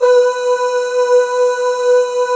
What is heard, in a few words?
B3 MALE OO; Northstar - New Gold VOL-2